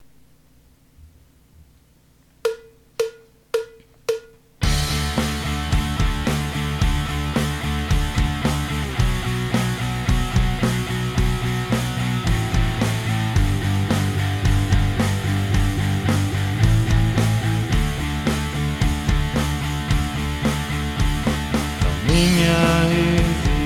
pop rock guitar backing track in D minus one guitar